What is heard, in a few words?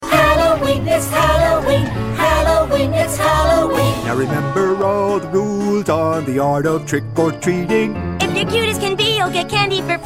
halloween song tone ringtone; the amazing world of gumball